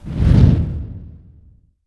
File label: fire